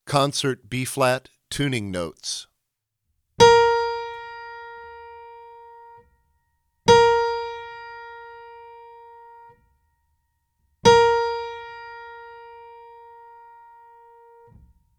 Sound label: High Voice – Tuning Notes; James „Jamey“ Aebersold Play-Alongs